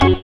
SYN, dance; Sound & Vision - Gigapack II

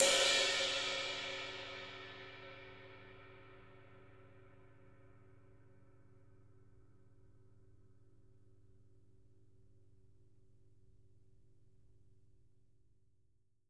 ride, Living Drums, JZ CRASHES; Sampleheads; Peter Erskine

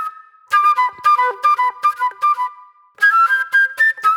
Flute, Disco

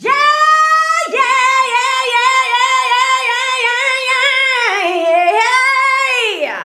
VOX, Singin

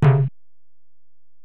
Tom, Alesis DM-PRO

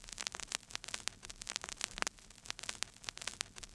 Low Passed Crackle, Vinyl Noise; noise hiss crackle

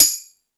Dance, Drum Machines

